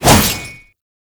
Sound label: Shield And Sword Whoosh; Warframe; Grineer; Mortifor